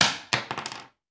Bullet Shell; Sound Ideas; Guns

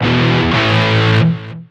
Pickup Guitar Riff; SFX Kit; Pickups

